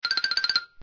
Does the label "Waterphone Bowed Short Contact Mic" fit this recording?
No